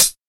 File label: Mellow Hi Hat Closed Sound C Key; hat samples